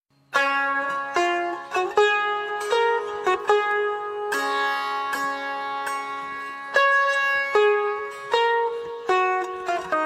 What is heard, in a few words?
PAK National Anthem